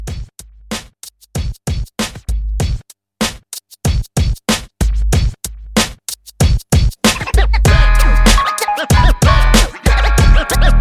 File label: Scratch Re Drum